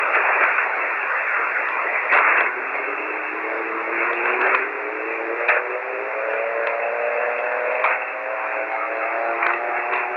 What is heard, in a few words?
tune thru 160 noise